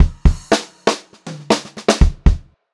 POP FILL, Pop-Rock Drumloops; 118 POP

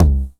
909 TOMS; Wizoo - Platinum 24 Electronic; 909 TOMS P2

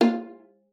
F4, VIOLA FINGER; Xsample VOL-5

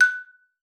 F#5, xylophone; Xsample VOL-4

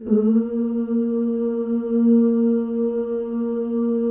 FEM OOS, Symphony of Voices; Spectrasonics

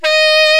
SAX COMBO

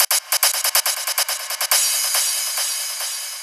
Fills, Loops 140 BPM

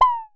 Synare Filter; Star Instruments Synare 3

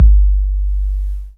LONG RAP KIK; DRUMS; Kid Nepro; Elite

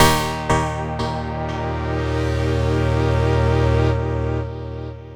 Synth Pattern; Patterns Progressions; Electrocution

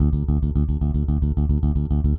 Sixteenth Notes D, Bass

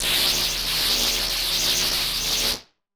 Casio, Glitched, malfunctioning synth

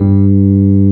SUITCASE, Keyboards Old School

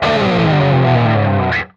PRS SLIDE, guitar

